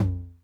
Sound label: Tom Low Mid Tune Mid Decay, 909